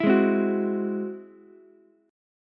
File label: G sharp Augmented 7th G sharp Ukulele; G#+7 strummed; JGuitar